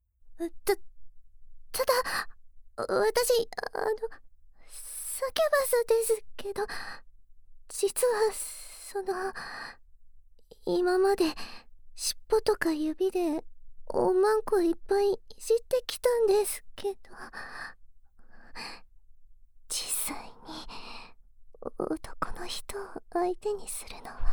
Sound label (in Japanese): 耳舐め手コキとフェラ; KU100